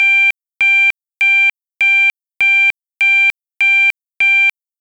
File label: tone 500 interrupted